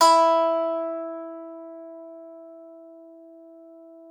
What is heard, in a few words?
PSAN E4, PSALTER; Xsample VOL-8